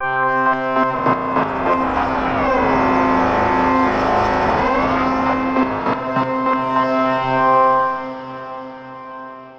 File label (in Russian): Shredder, пэды